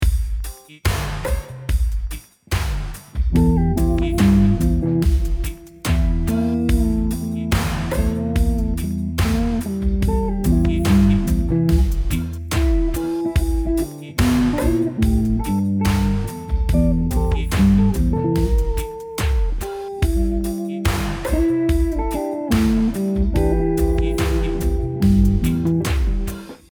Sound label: slo funk